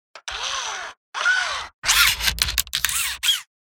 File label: ROBOT SMALL